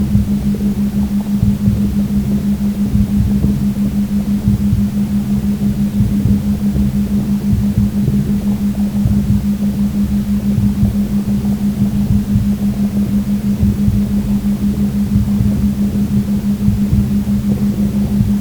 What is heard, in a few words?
Meditation Music White Noise Sleep Sounds Brown Noise - Ambient Theta Waves